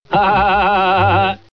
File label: laugh high